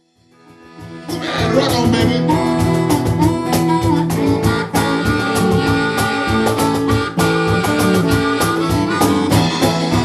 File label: cajon